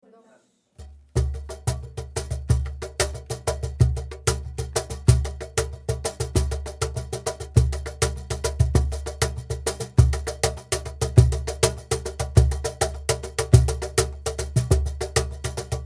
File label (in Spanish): Cajón